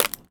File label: Foley